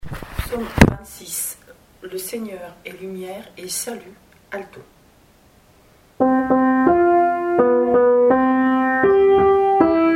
Psaume 26 Le Seigneur est lumière et salut ALTO

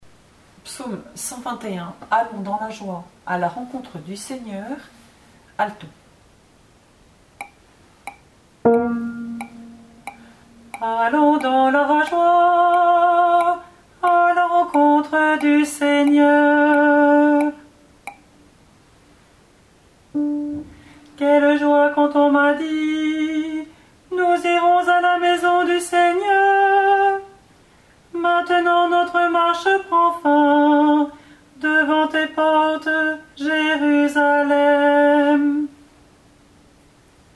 Psaume ALTO; 121 Allons dans la joie A la rencontre du Seigneur

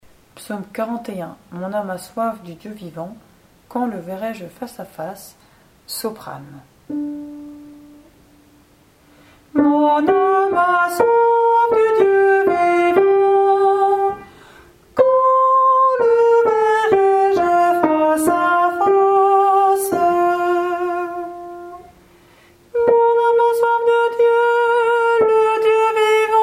Psaume 41 Mon âme a soif du Dieu vivant SOPRANE

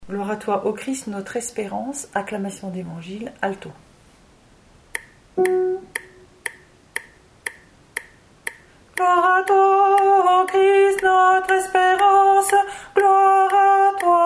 ALTO; Acclamation évangile Gloire à toi ô Christ notre espérance

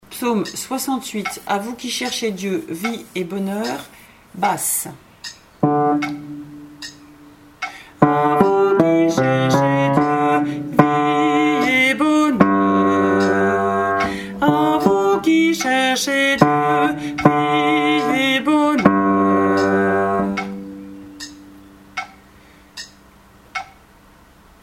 À vous qui cherchez Dieu Psaume Basse; 068 Kruczek

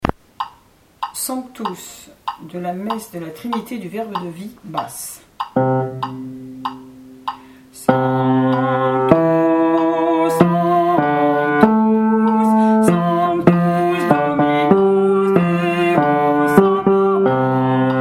SANCTUS BASSE; Messe de la Trinité du V de V

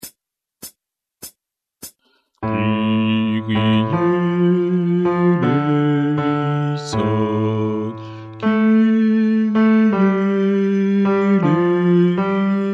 Messe de St Paul Kyrie Guide chant Basse

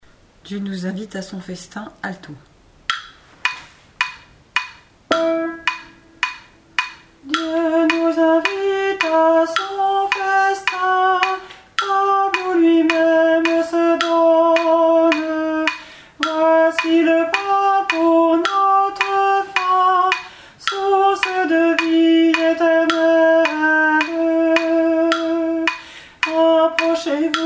Dieu nous invite à son festin ALTO

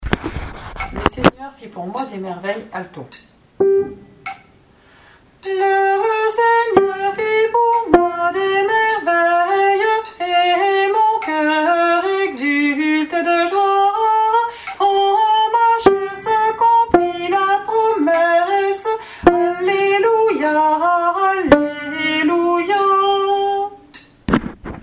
Le Seigneur fit pour moi des merveilles ALTO

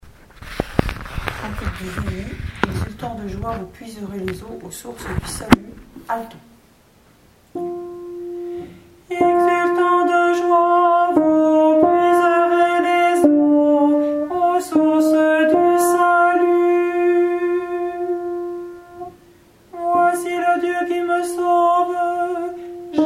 Cantique d'Isaïe Exultant de joie ALTO

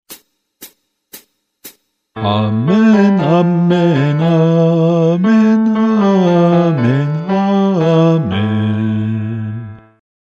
Messe de St Paul Doxologie Guide chant Basse

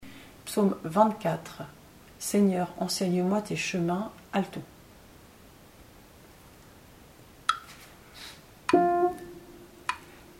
Psaume 24 Seigneur enseigne moi tes chemins ALTO

